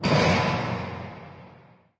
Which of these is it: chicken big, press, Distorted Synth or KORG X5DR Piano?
press